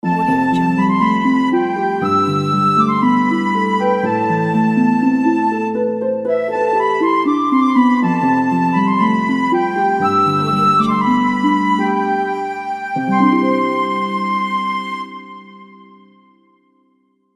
middle ages harp and flute logo

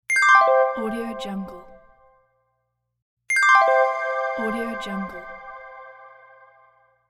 dream ending logo sting

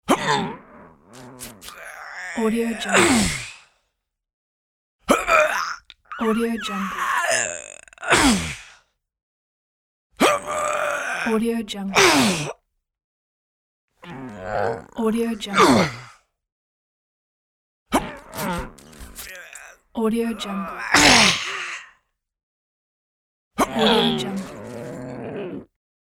weight lifting grunts

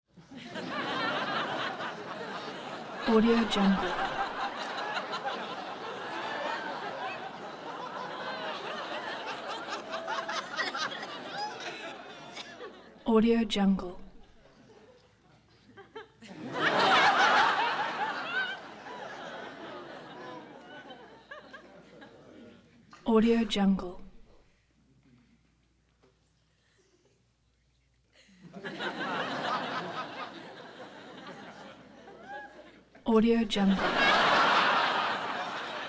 crowd laughing; prosoundfx

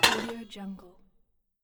small metal hit

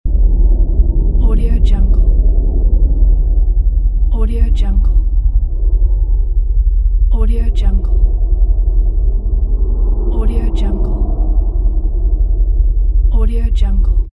very low background

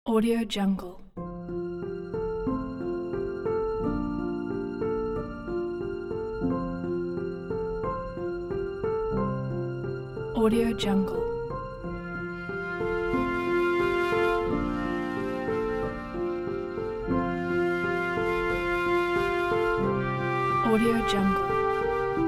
relax piano

